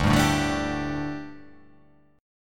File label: D Suspended 4th D